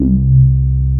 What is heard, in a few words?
A BASS; CMP - Analogon